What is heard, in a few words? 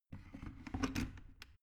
plastic water boiler place soft